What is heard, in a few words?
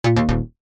error pop